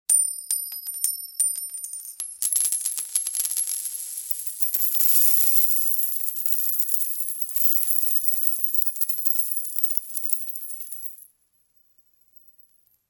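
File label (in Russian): мелкие монеты звонко падают на плитку или камень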